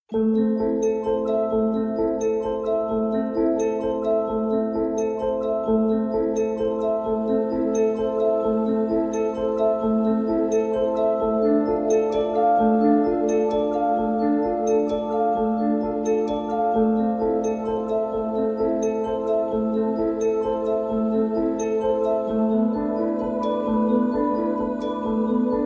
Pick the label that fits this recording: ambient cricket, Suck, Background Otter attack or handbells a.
handbells a